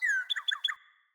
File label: Cardinal Male Bird Song